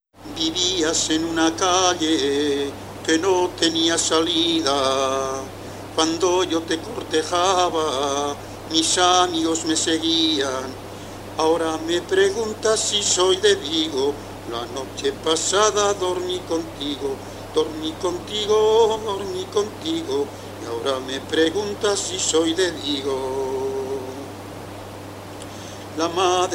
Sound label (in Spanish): Jota, Villabrágima; Jota "Ahora me preguntas si soy de Vigo"